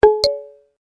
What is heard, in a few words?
note, notifications; Lenovo S938